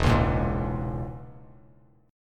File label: E 6th Flat 5th Bass Standard, E6b5 chord; strummed; JGuitar